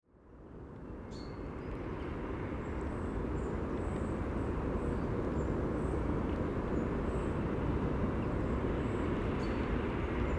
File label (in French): Centre Clapet; les boucles de l'isère; voix d eau